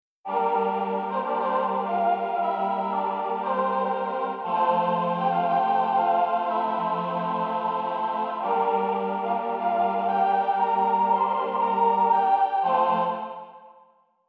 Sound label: Vocoder Choir